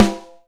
Snare, Alesis DM5

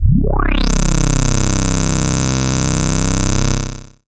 bass c, Sub Bass; Dance Synths; Samples vol.37 - Dance Synths

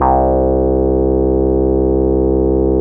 ODY bassics C2, vintage keyboards; Big Fish Audio - First Call Vintage Keyboards

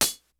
HATS CYMBALS; DRUMS; Kid Nepro; Elite

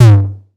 808 TOMS; Wizoo - Platinum 24 Electronic

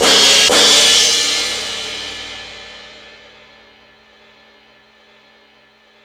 Sluggo Drums, Drum Machines

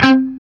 CHUG C 3, Guitar; CHUGS; East West; Steve Stevens Guitar Samples Collection

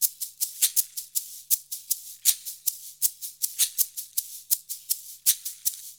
80 MIX, Percussion Loops

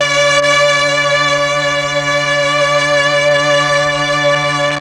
MEL STR, Legendary M400; ILIO; Propeller Island Legendary M400

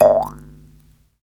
JEWS HARP; Jew's Harp & Spoons

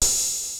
DULL KIT; Rubber Chicken Software Sample Bank; DRUMS + PERC

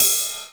GROOVE RIDE, Drums Dance & Groove; USB Soundscan vol.11; AKAI; GROOV KIT

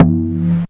DRM PRC Conga; Polyend; Plughugger

